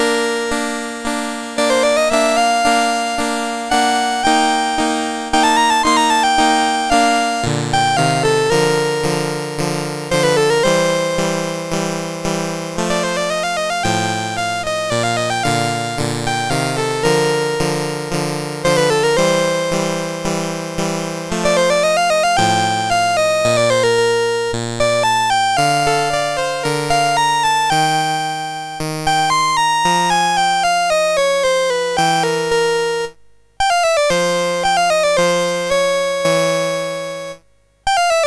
Andante in B Flat Major, MOS6581R4; Bertron

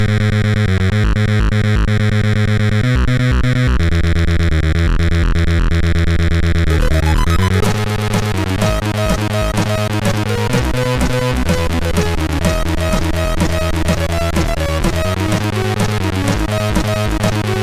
bassline intro, MOS6581; MOS6581R4

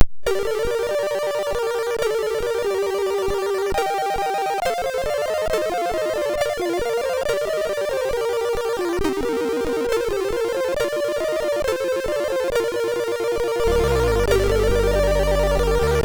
sid, MOS6581R2; Red Star

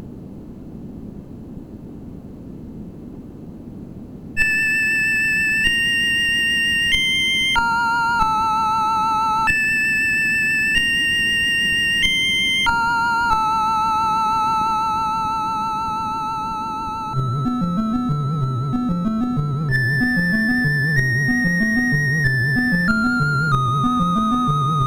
Intro, MOS6581R4

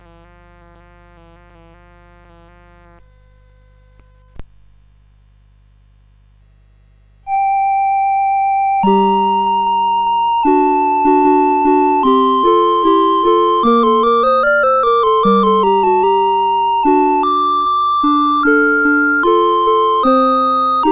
CSG8580; CSG8580R5

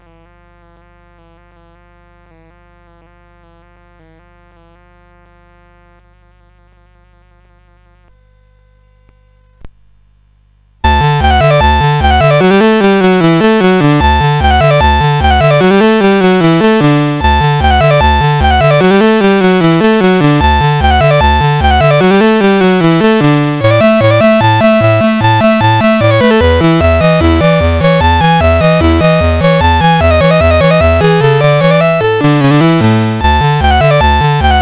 Musette; CSG8580R5

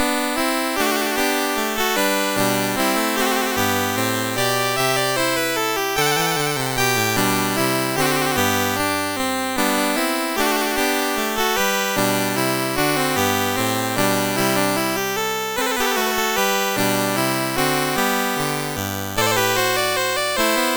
MOS6581; MOS6581R3

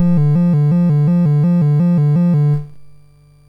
sid, MOS6581R2; Donkey Kong 1983

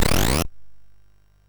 MOS6581R3, sid; Powers of Gloom; hvsc

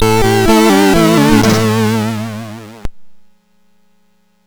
sid MOS6581R4

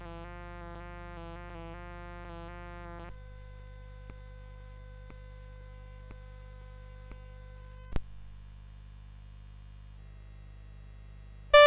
Fugue in G Major, CSG8580R5

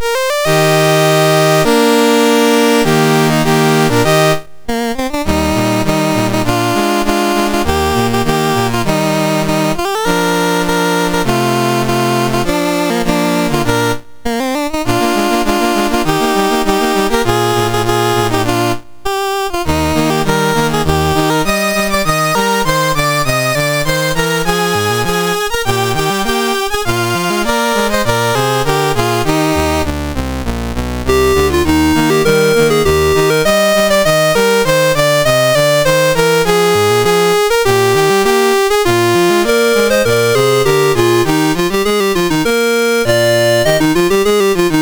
MOS6581R2, National Anthems; Nicaragua

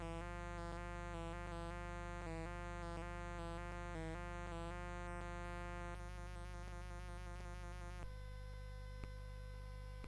March; CSG8580R5

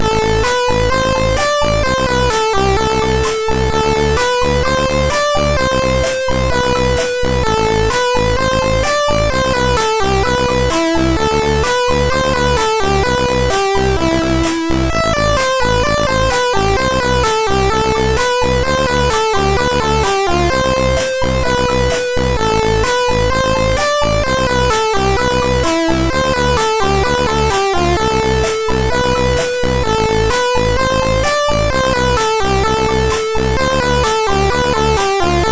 Emotional, MOS6581R3

